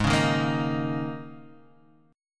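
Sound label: Ab Suspended 2nd Suspended 4th chord, Guitar, Half Step Down tuning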